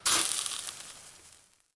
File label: fizzle